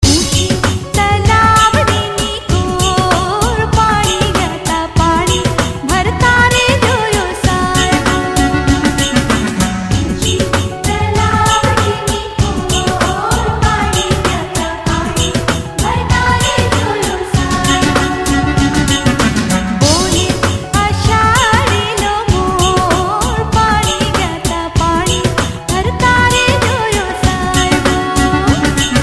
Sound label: gujarati garba